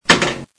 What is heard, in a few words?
slingshot